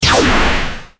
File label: radiant light burst anime